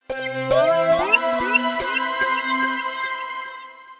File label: desktop logout